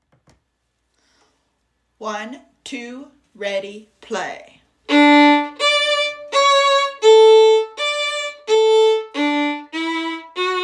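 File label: Trumpet Voluntary Violin 2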